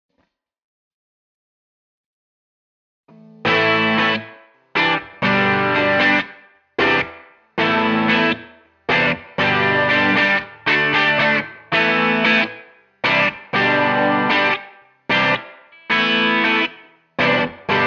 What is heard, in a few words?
crunch